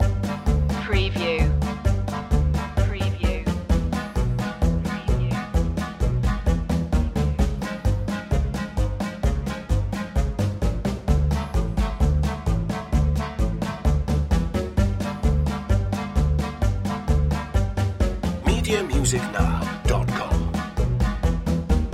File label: quirky comedy loop